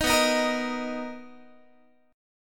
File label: B Major 7th B down Guitar; strummed